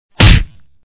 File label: punch